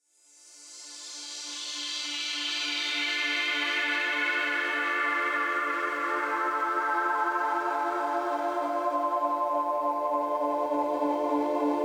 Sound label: Alto